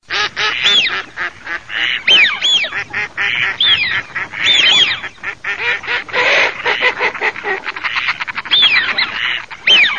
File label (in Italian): GERMANO FISCHIONE